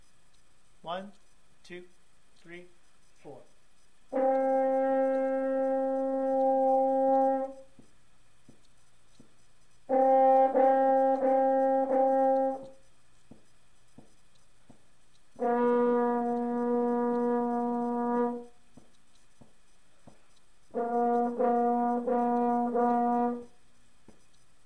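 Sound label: french horn tongues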